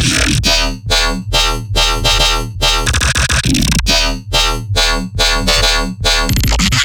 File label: Riddim Bass Loop D# (140); Bass Loops; Rendering; Ghosthack; Riddim Essentials 2020